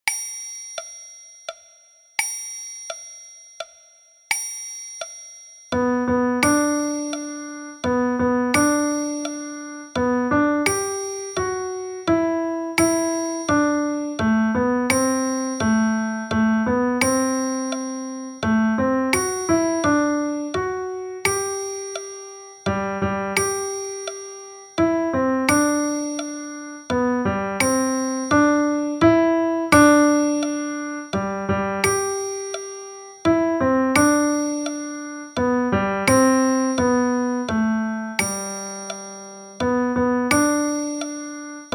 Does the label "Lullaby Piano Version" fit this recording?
Yes